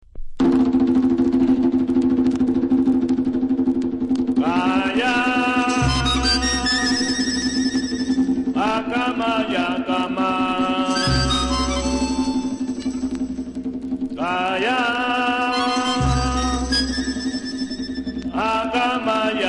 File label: talking drums